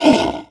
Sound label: zombie pain